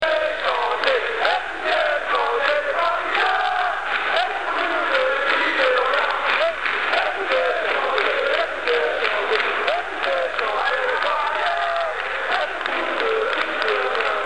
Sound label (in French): chanter les parisiens, chant football; psg; Écoutez chanter les parisiens